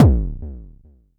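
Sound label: Mod bass drum, Jomox Airbase99, Drum Machines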